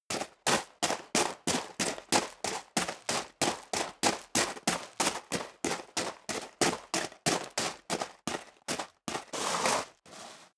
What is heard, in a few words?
step leather shoes gravel medium run sequence; schritte